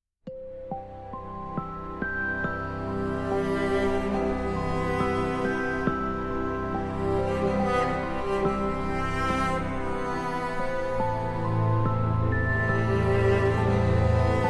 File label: cello melody